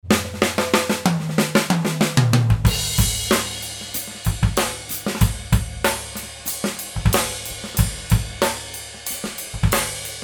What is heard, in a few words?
Groove